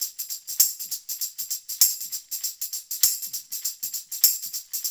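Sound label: loop, tambourines; InVision Interactive; Percussion Slam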